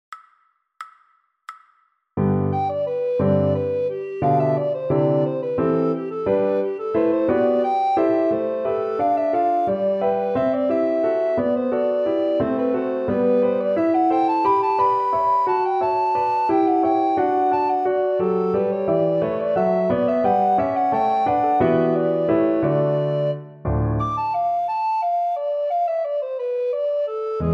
Recorder; Murril

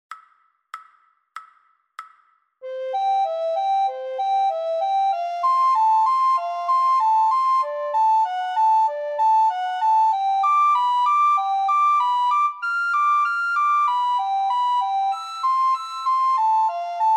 Two Studies - slow, recorder; Linde

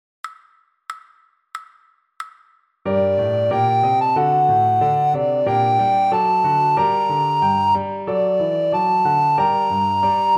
recorder slow; Handel

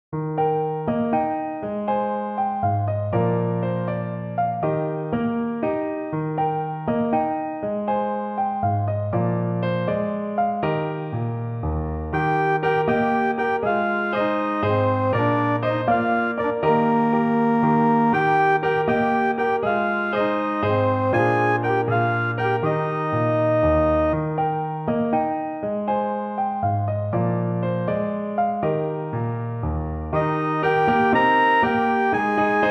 trumpet

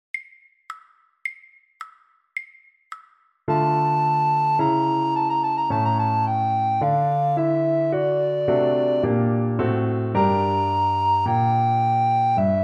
recorder; Telemann; 2nd mvt

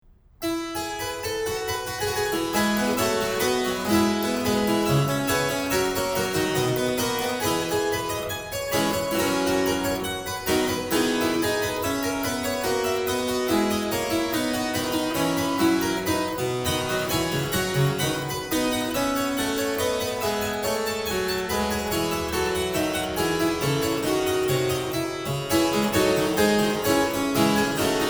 Harpsichord; Bach; Prelude in F; BWV 928

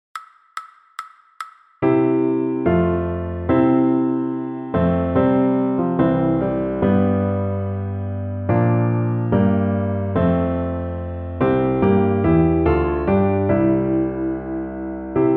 piano

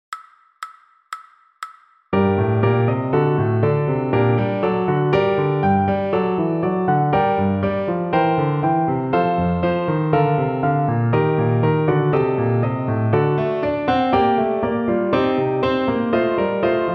piano fast, recorder; Handel

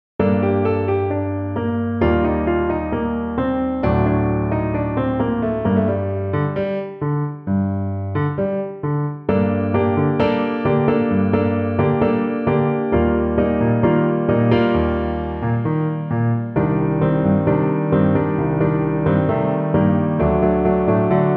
piano

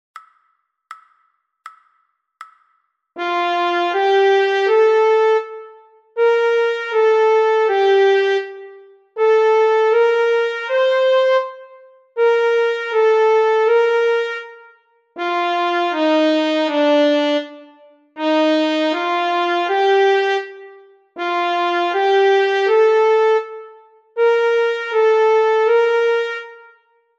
Slur Study trumpet

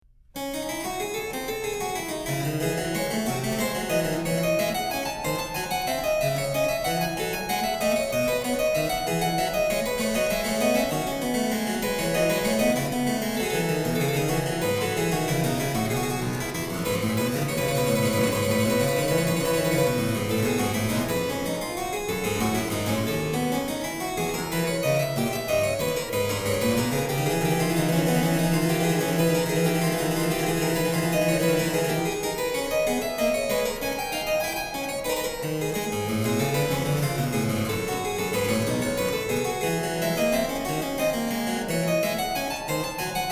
Invention Harpsichord; Bach

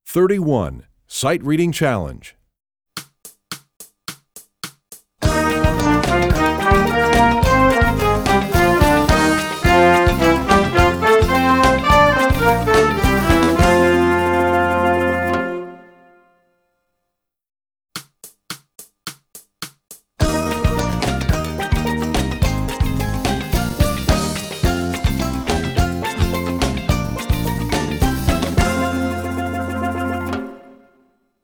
Sightreading Challenge, trombone; essential elements

